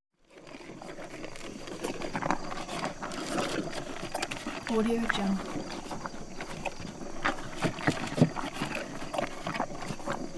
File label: horses eating ambience